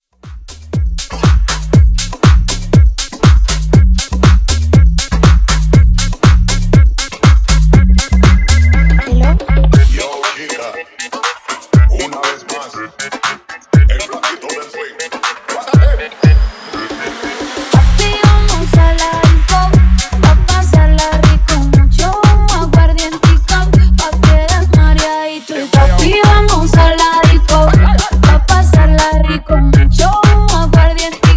House Remix

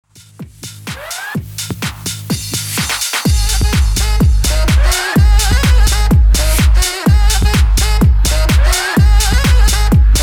HOUSE MIX 126 BPM

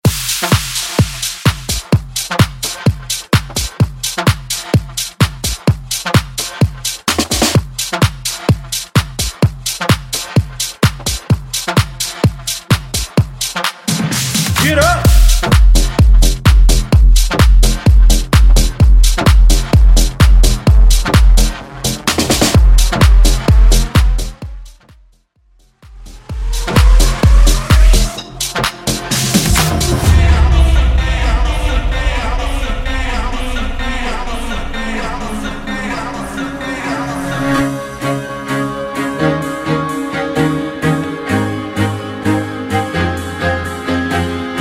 EDM Club Mix

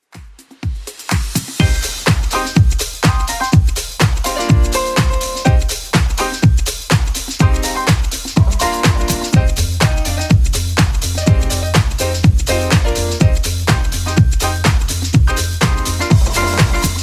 80s club 124 bpm clean; 80s Club Edit

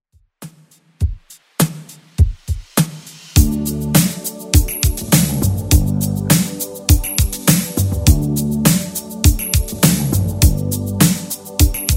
80s Redrum 102bpm

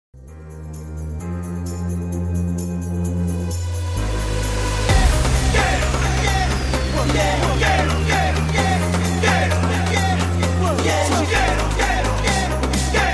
Epic Festival Edit 130Bpm Clean; djyan

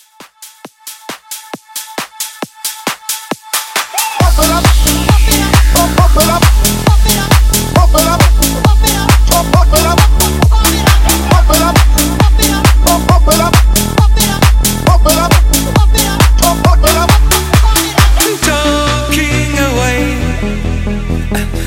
House Hype Edit 135

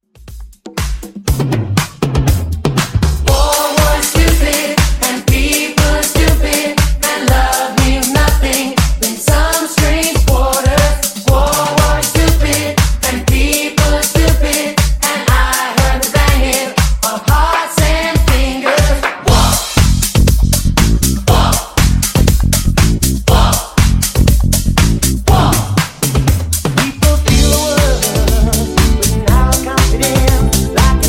Funk Redrum 120 Bpm

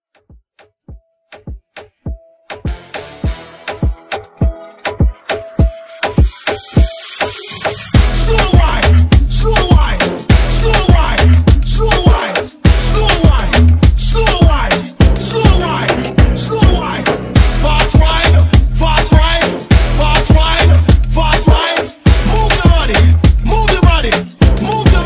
Moombah

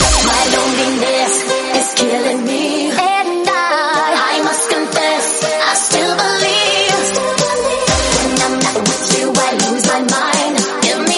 Tech House Snippet 122bpm Cm

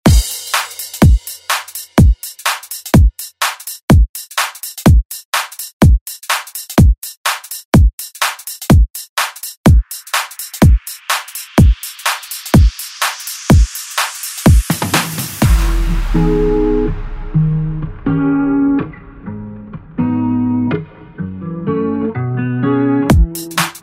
Re Drum; DJ Mhark

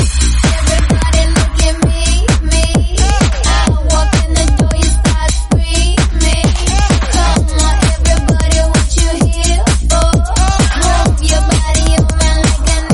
130bpm Bbm

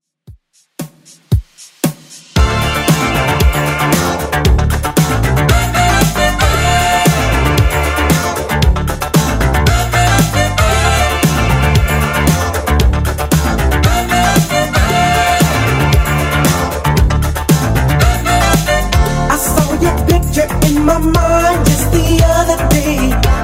70s Redrum, 115 bpm

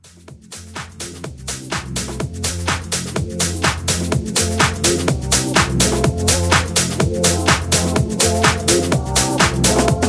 Funky Club House

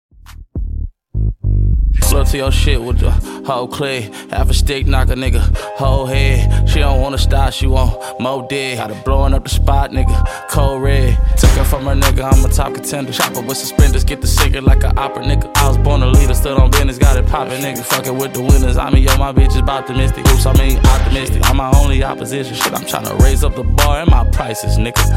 Dirty 102 Bpm

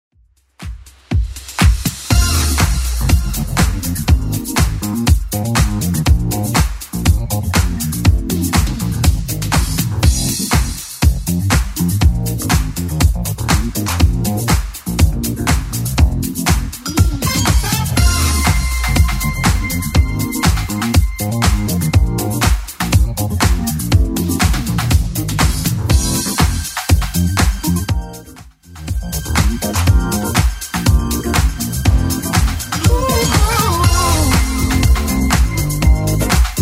80s club 121 bpm clean; Darren Crook

